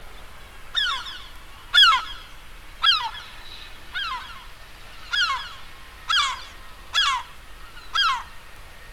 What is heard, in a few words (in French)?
goeland argente